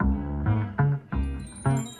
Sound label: crazy girl, Loops; Polyend; Loops n trouble